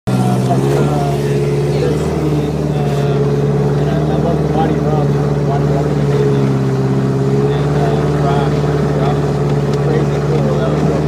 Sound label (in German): Nam Ou Stromschnelle